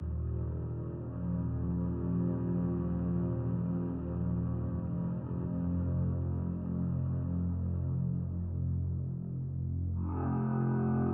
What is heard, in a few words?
music raven loop; Reigns Game of Thrones